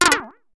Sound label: error, Interface Sounds; Kenney's Sound Pack